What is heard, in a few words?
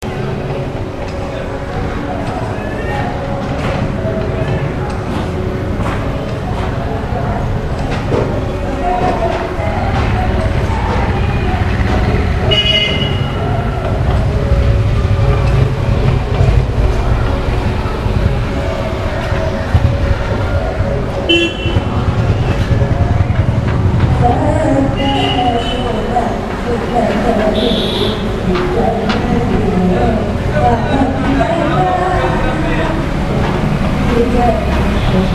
eswara temple, india; Ted P. Eugenis